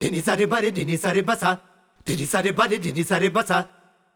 Bhangra, Vocals Male, World Pop; Sonic Foundry (Sony Creative Software)

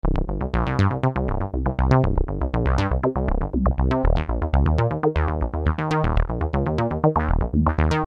Syn-Bass s C, Techno Synth Loops, 120bpm; Sonic Foundry (Sony Creative Software); Signals I

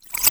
REV, SOUND EFFECT REVERSE

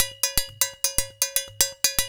120 JU, African Contemporary Ju Ju; Spectrasonics; Supreme Beats

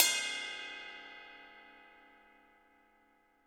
HiHat & Crash, Roland TD-20; HiHats

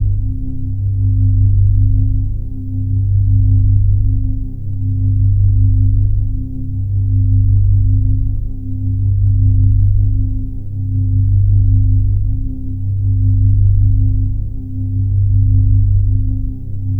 Drone, keys; Sonic Foundry (Sony Creative Software); James Johnson Spektral Minimalism; Sublime Keys

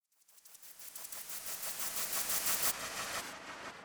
Swept Noise

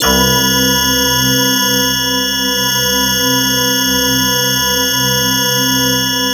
TENSION B3; MELODICS

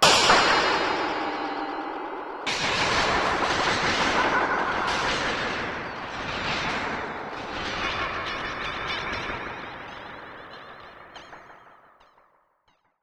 Ring Mod & Delay, contact mic Cymbal

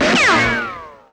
short sling; Greytsounds; CARTOON FX

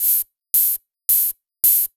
TR HH FX, Ragga & Dub Reggae; USB Soundscan vol.27; AKAI

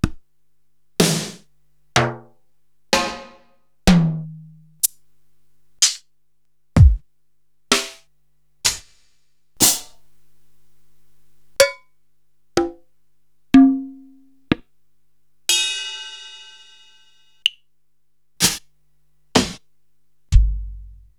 Korg TR-Rack, Samples Sliced; m8

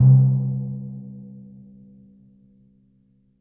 C2 p, Timpani, piano; PowerFX; Downtown Orchestra; scales

